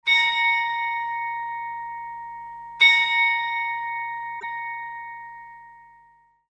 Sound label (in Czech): zvon; dar za uzdraveni; zvonohra tony